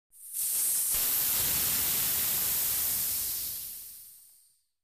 Biking Air Rel

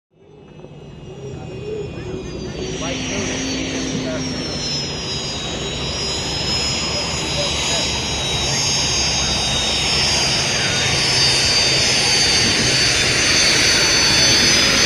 Hydroplane Dock Amb

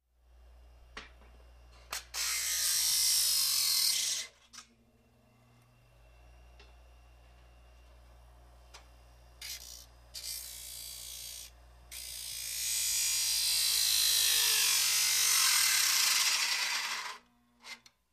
Elect Grinder Grindi, grinders

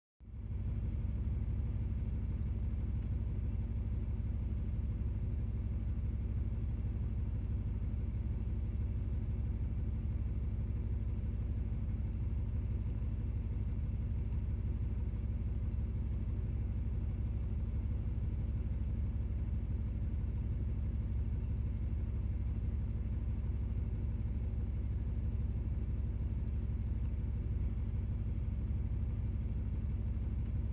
Truck Interior Idle

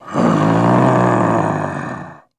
Werewolf Breath Airy

